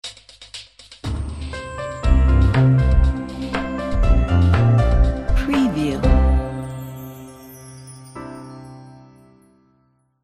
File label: short corporate logo